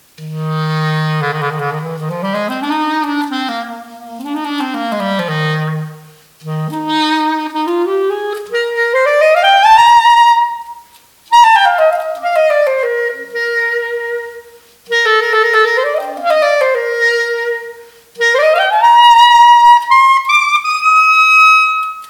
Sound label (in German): Ventil Klarinette Hall